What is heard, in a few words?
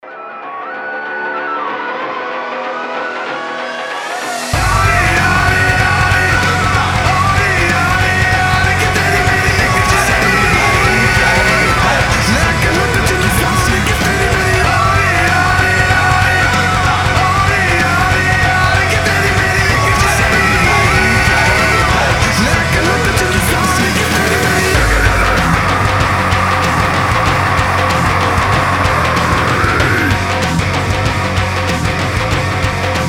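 upbeat Indian metal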